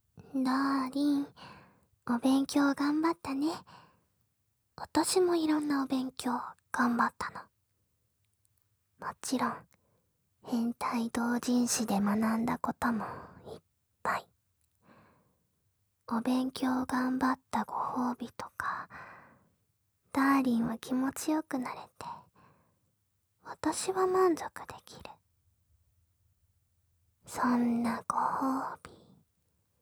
耳舐め; 勉強を頑張ったご褒美に耳舐めしてもらえるボイス

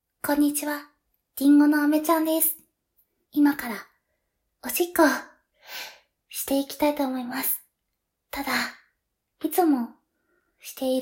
お風呂で オシッコですッ

